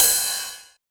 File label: 909 Ride; Novation Drumstation